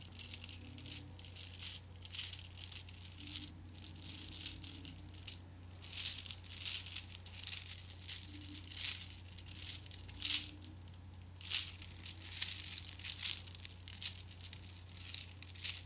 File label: strings